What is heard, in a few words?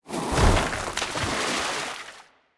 Victory Monster Splash; Susano; Smite